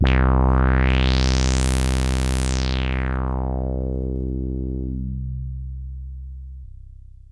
SYNTH

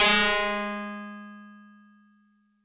TBells DX100 Gs3

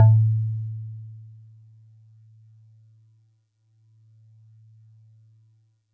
Celeste DX100 A1; Pristine Celeste

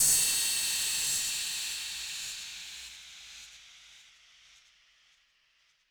Cymbal Long Verb Pitch

